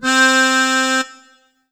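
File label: Alesis S4 Plus Tango Accordion C4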